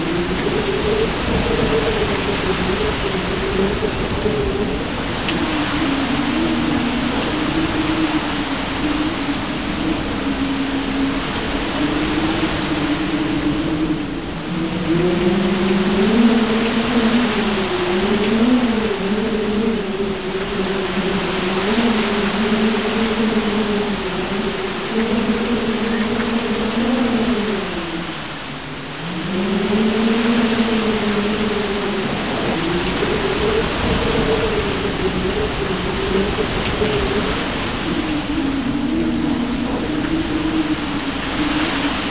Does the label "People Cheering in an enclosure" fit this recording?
No